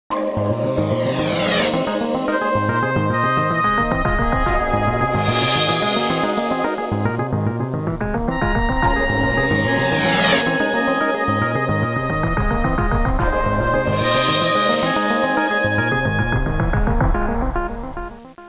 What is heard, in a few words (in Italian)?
musica elettronica